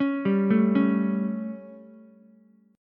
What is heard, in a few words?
G Suspended 2nd Flat 5th G Ukulele; Gsus2b5 arpeggiated; JGuitar